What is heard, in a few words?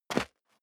step sneaker concrete grit single stair down; schritte